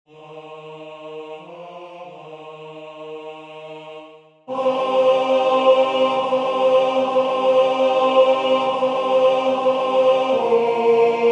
Christus factus est contra alto; De la rue

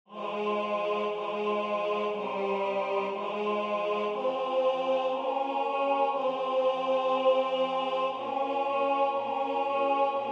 Hoc est Corpus meum tenor

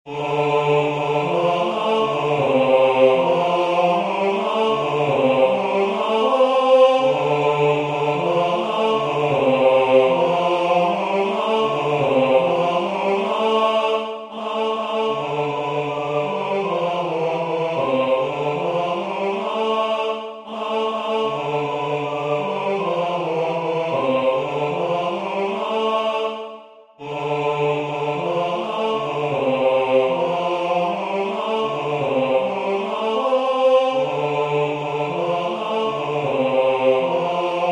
Stella splendens homme; Livre Vermeil de montserrat

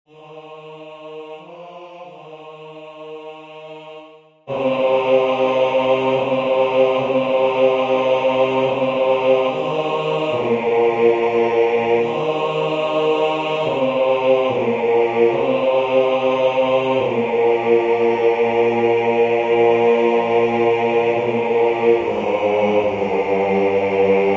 Christus factus est basse; De la rue

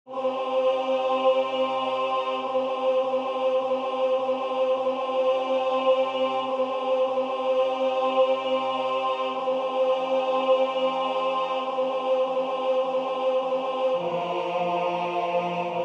Cor Jesu sacratissimum tenor; Gauthier

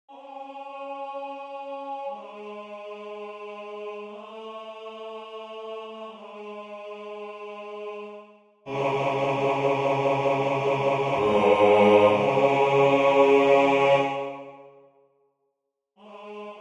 Ecce Homo bassus

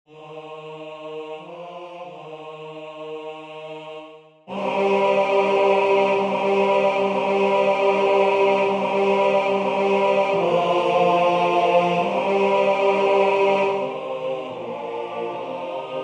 Christus factus est tenor; De la rue

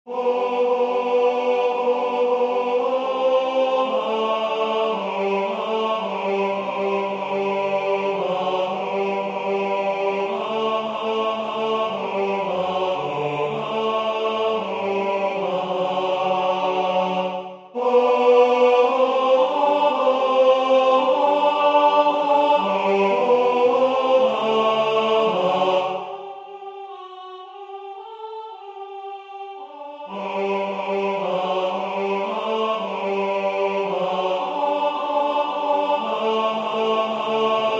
Adeste fideles tenor; Willcocks

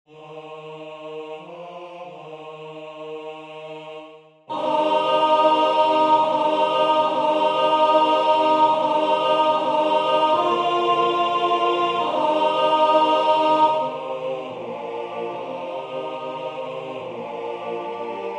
Christus factus est superius soprane; De la rue

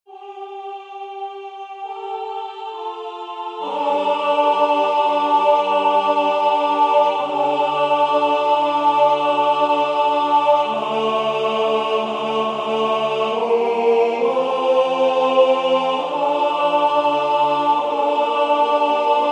Christus factus est tenor; Anerio

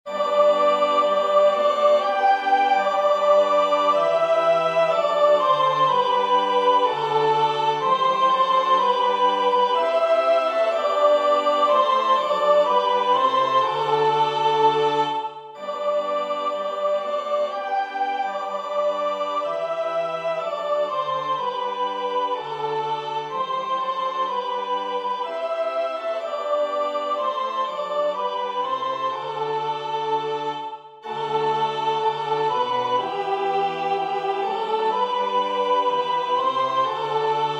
Tollite hostias soprane

